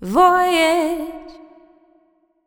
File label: Voyage, Female Vocals, Wet; Advent Calendar 2017 Day 1